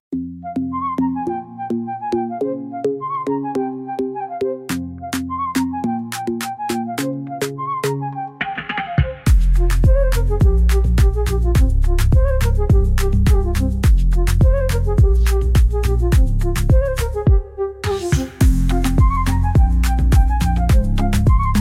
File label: Afrobeat Mix; Afrobeats